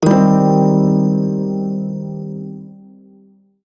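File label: pentatonic minor chord, shawzin; B; Warframe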